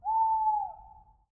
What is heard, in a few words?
owl; Mount&Blade Warband